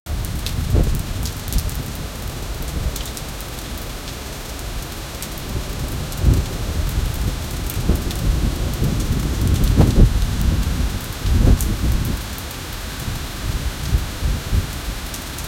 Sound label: falling rain and the wind, city